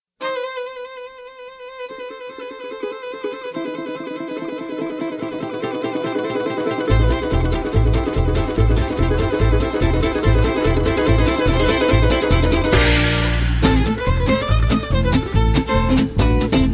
Guitar

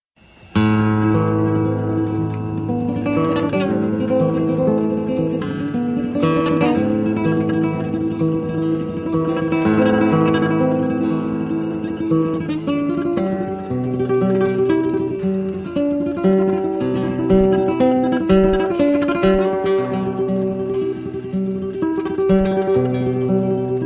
Classical Guitar

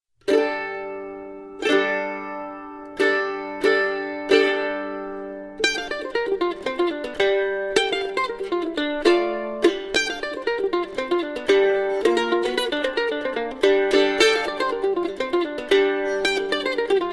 Mandolin

